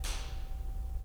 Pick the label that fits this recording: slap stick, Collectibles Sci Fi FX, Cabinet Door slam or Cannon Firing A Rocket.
slap stick